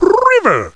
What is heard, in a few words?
River; PUZMANIA